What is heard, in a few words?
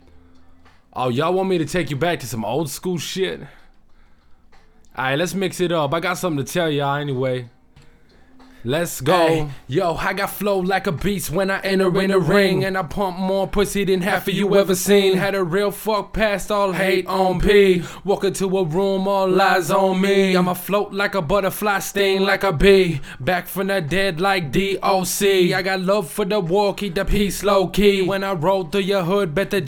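hip hop, 93, E minor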